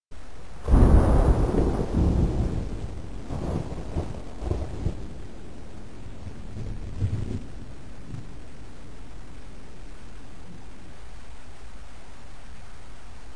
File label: storm